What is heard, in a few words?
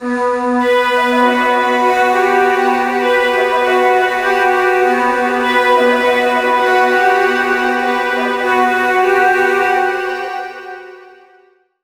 Flute Intro 100BPM Amin; 99Sounds x Monosounds; Instrument Loops